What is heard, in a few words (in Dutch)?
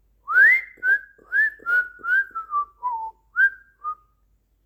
fluiten